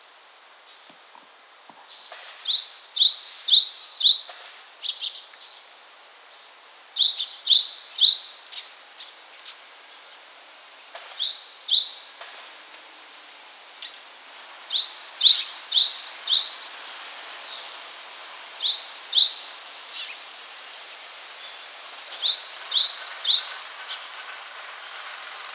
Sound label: Parus major